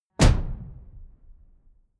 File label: sci-fi shot bright; schusswaffen